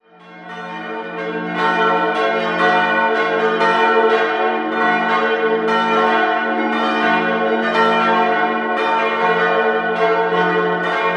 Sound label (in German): Pilsach, Glocken